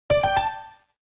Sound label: Alert InstantMessage; Samsung SGH-i200; Alerts